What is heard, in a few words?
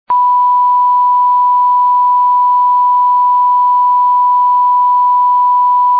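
b natural, tuning, recorders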